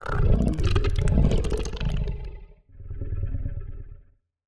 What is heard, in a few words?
Alien Distant, Monster Sounds; TheDeclineOfWarriors; Monster Sounds Volume II